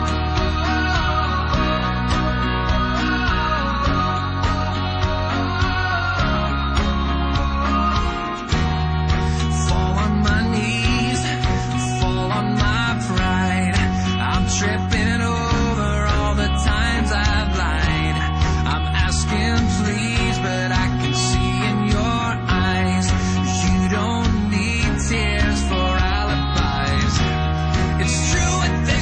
guitar

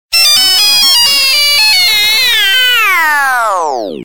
nokia old remix

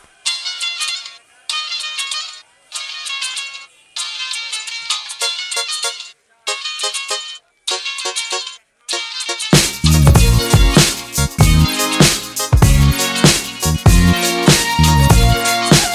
Swing

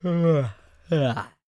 Male Fighter Yawn

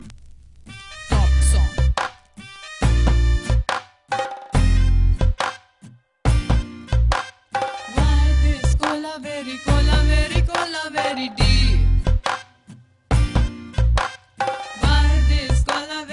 flute mix; ringtones; hip-hop

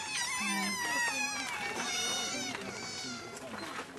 Sea Otter

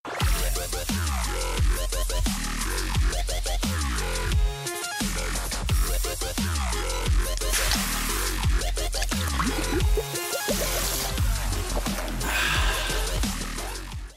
Dubstep

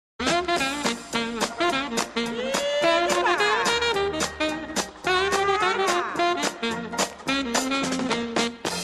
Happy orchestra

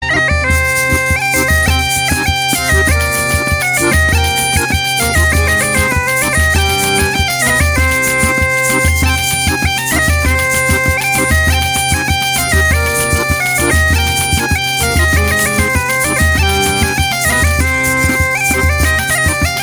GAITA

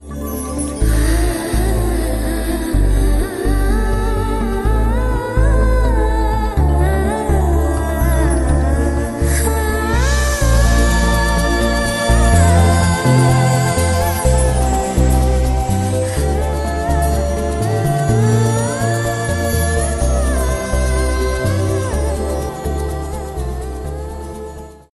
Slow BGM

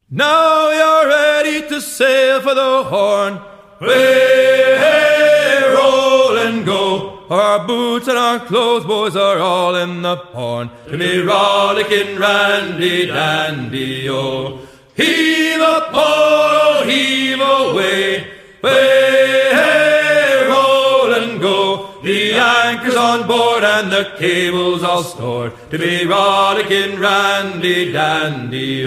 Sea Shanty

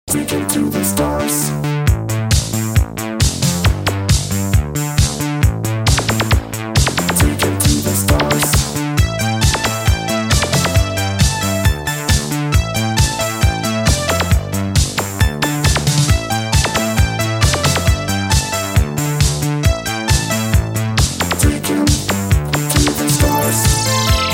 Disco Stars Ringtone

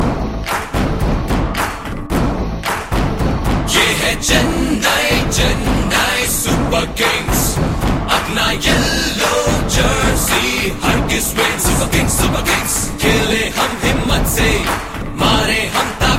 anthem hindi; ringtones; games; ipl csk

